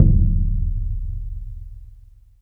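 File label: orchestra bass drum; Greytsounds VOL-1; ORCH PERC 1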